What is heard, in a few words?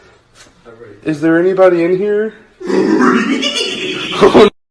rehehehe